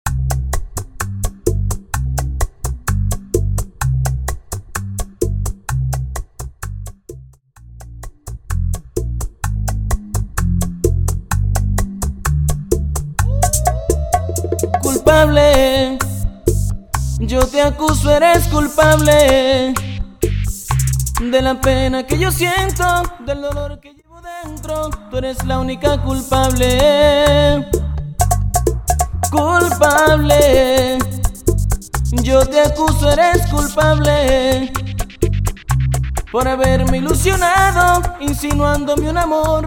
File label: Percapella Intro Outro 128BPM